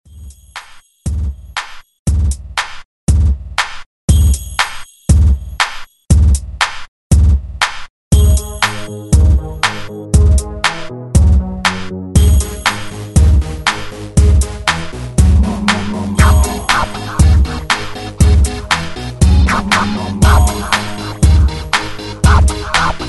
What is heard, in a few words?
Scratch 119 Bpm; DJDOLLS